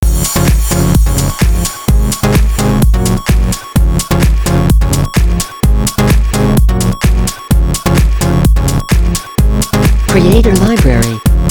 Modern Dance Chart Opening

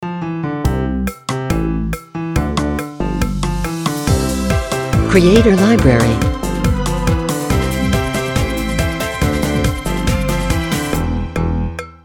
Funny Walk Logo; Logo-Idents

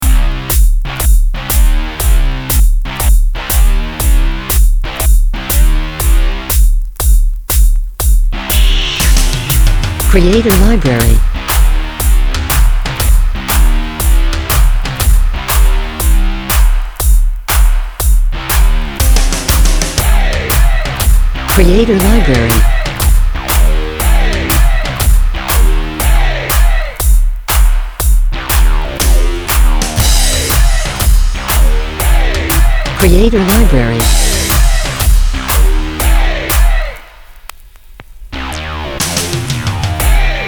Arena Anthem, Rock

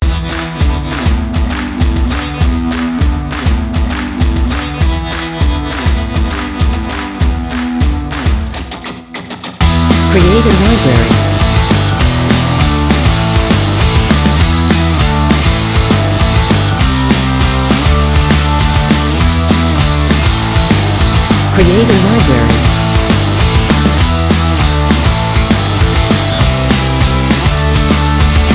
Upbeat Rock

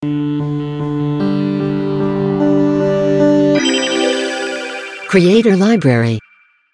Audio Logo

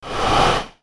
Short Swoosh

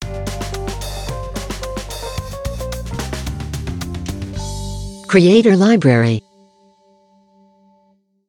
Action logo; Logo-Idents

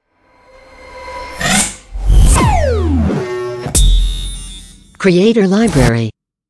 Modern Electronic Logo; Logo-Idents

